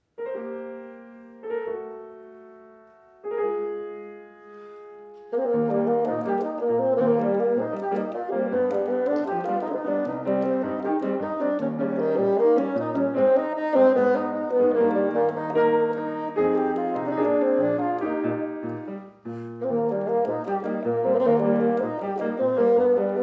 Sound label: Duo for Bassoon and Piano, Habanxixango